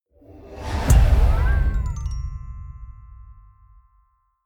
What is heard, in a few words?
whoosh playful bell